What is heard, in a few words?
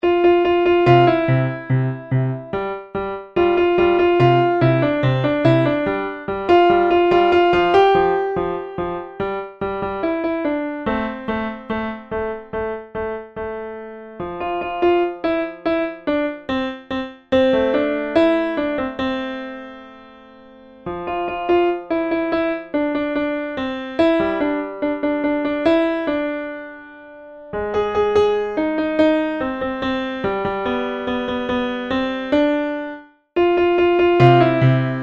Level 1 Piano Midi